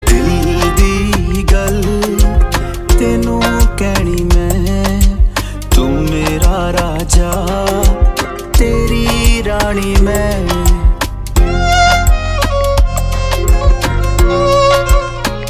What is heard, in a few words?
Raja Rani Bgm Ringtone